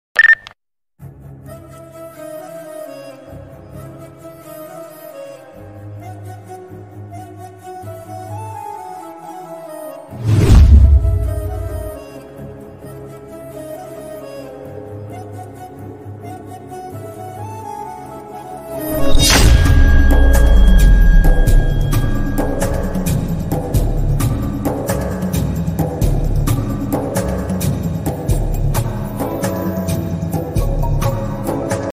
love bgm

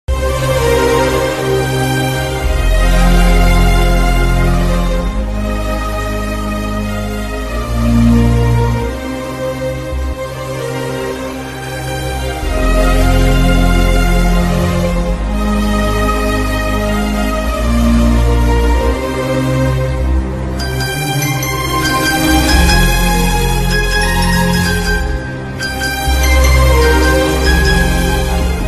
love failure ringtone telugu love ringtones; mrjack ringtones

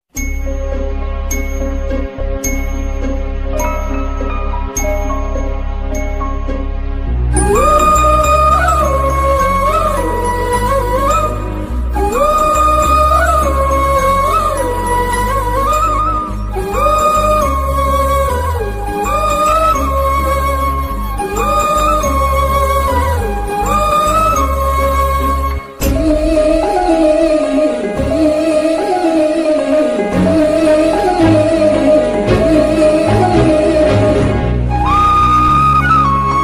8d bgm audio music; pandem kodi movie

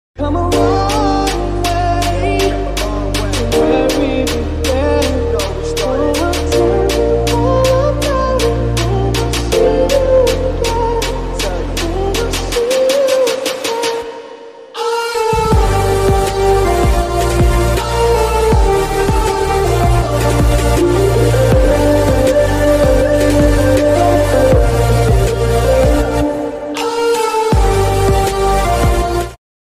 remix ringtone; fast and furious; paul walker

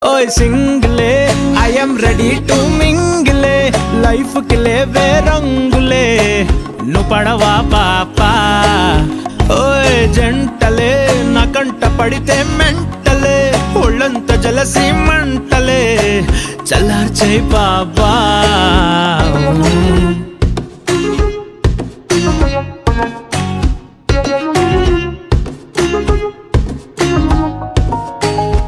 Singles Anthem

